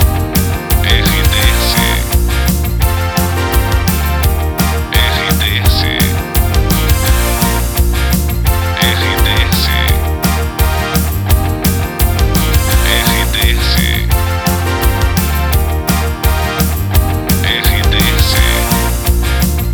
TRILHA PARA LOCUCAO WEB NET POPULAR

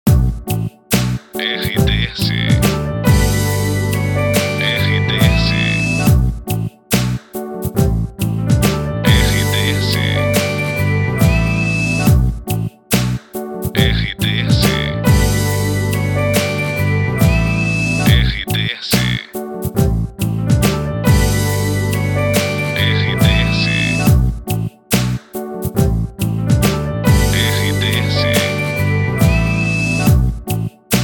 TRILHA PARA LOCUCAO; Pacote Web Net; Web Rádios

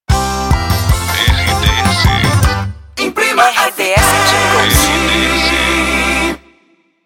volta de bloco curta hit retro; IMPRIMA FM; vinhetas cantadas para programas

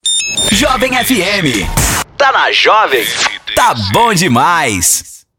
passagem; Jovem FM

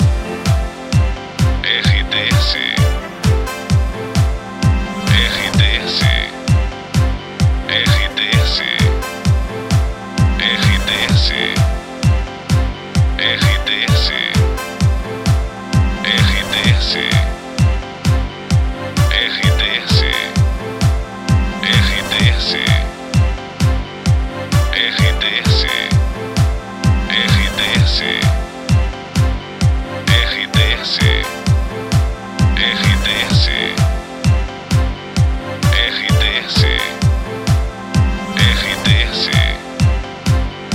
TRILHA PARA LOCUCAO WEB NET JOVEM

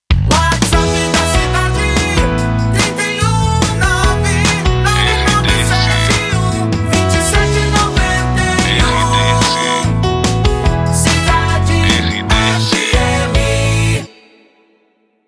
passagem whatsapp; Cidade FM

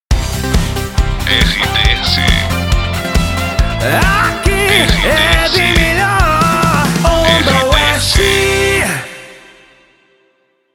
passagem; Onda Oeste

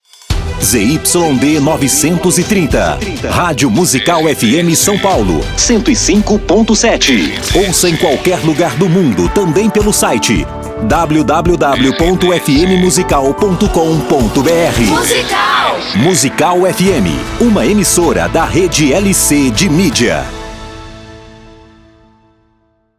prefixo musical

